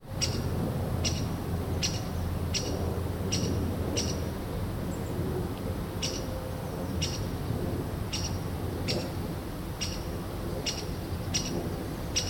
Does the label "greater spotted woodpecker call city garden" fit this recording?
Yes